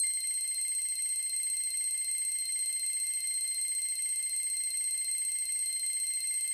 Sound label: count up loop; netent